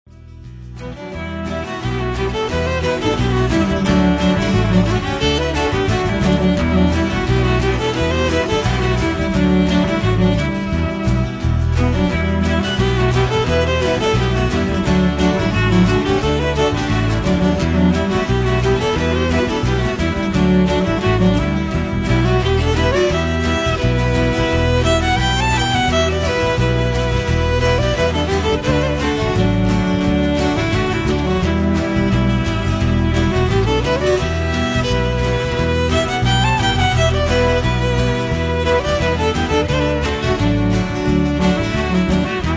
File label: Cello